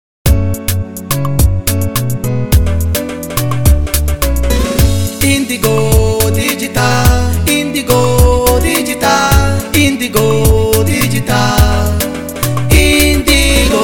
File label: jingle; INDIGO